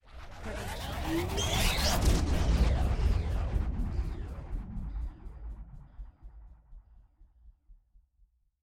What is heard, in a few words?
Laserish Riser; soundscrate